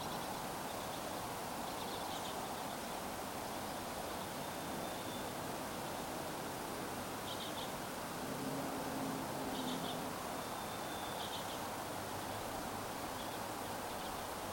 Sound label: birds